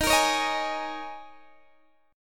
D sharp 7th Suspended 4th, Guitar, Open D

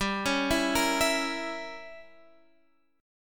G Diminished 7th, Guitar, Standard; arpeggiated; x,10,11,9,11,12; JGuitar

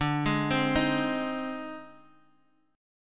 Ab Major D, Chord; arpeggiated; Ab/D; JGuitar

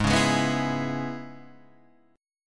Db Minor 7th Ab, chord

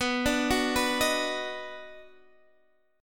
D sharp Minor Major 7th Sharp 5th B

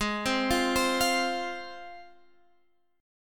G sharp 6th G sharp, down; G#6; arpeggiated

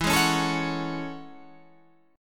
A Major 13th, guitar

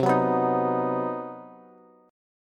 C Minor Major 7th Flat 5th C down Bass; strummed; JGuitar

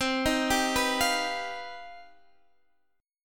C Minor Major 11th C

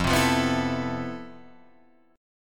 F Major Sharp 11th, Guitar, Drop D

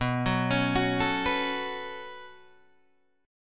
B 7th Suspended 2nd, Guitar, Open D

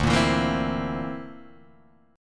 D 7th Suspended 2nd Sharp 5th, Guitar Drop D

